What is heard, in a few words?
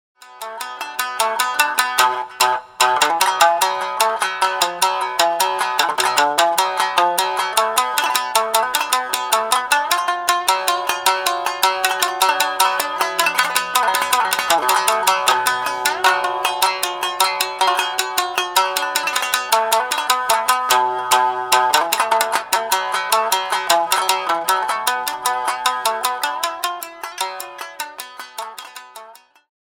shamisen solo